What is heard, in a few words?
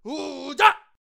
Shadow Walker Attack Vocals